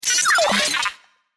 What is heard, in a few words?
CIRCUIT CHIRP